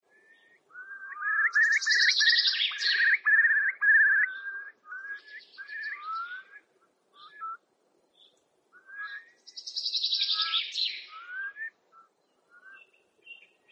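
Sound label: Eurasian curlew voice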